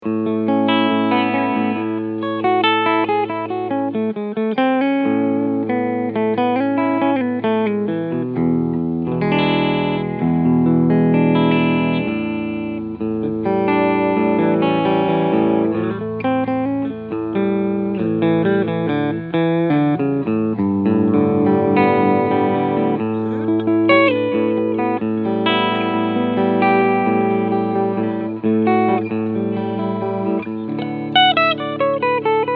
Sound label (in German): Cleansound beide PUs